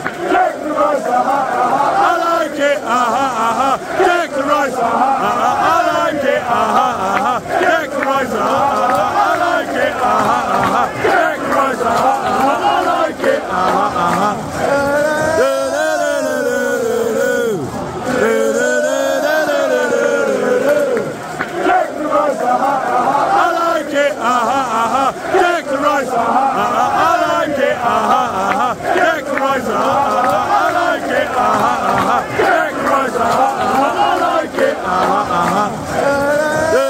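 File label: player chant fanchants, football chants; declan rice arsenal; united kingdom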